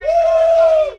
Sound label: "woo"